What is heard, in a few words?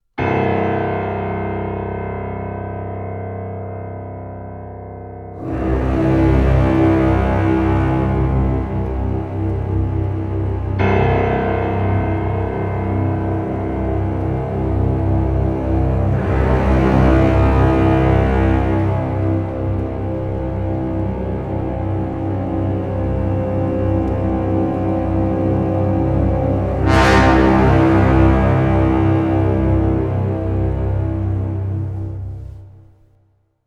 orchestra drone no perc